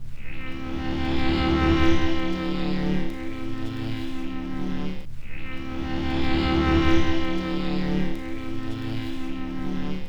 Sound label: Fake loop 95BPM; radiosonics